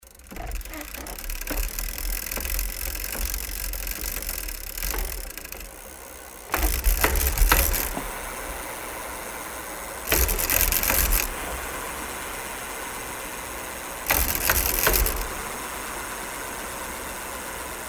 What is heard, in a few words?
bicycle pedal middle